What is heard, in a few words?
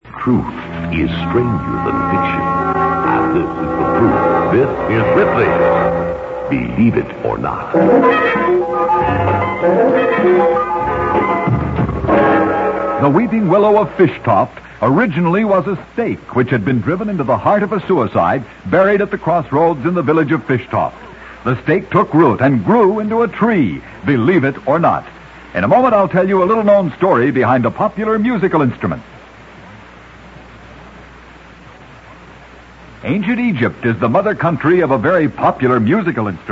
Bagpipes; 500 Radio Show Sampler; OTR